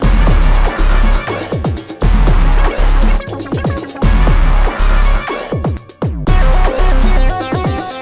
Loop Heroic

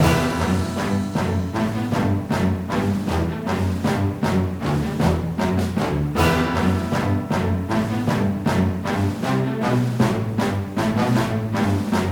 Music Beat; Learn to Fly 3

